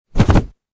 Body Fall Human; Wrestling and Struggling; Combat

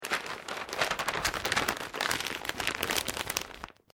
Paper Sheet Wrapping Rolled Tightly; Material components for constructing more complex sounds

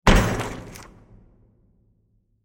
Metal Impact Mixed With Plastic Elements; Bursts; Impacts

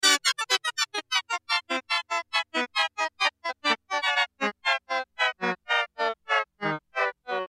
Melody High Fast Power Loss, Toy Melody; Cartoon; Musical; Toy Melodies; Toys; Blastwave

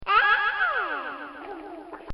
Baby; Voice

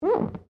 GLASS SQUEAK FINGER

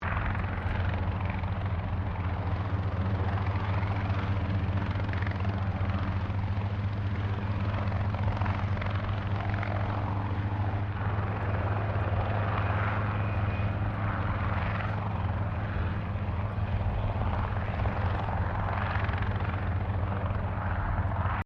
helicopter Robinson R22 flying distant loop; Helicopters; Vehicles; Aircraft Collection

